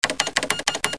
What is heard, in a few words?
Hello Typewriter; Печатает